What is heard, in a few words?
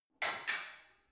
barbell only lift up reverberant; sport freizeit